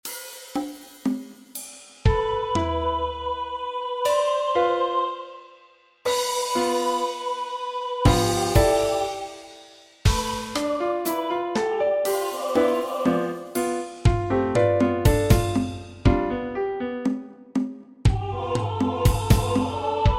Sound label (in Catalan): percusio i veu